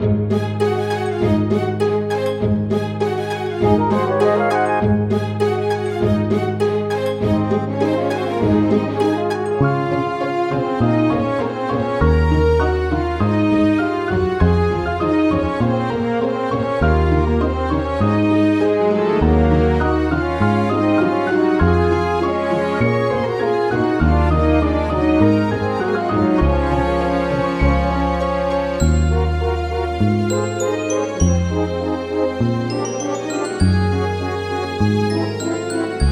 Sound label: market; Manamon Music; Manamon 2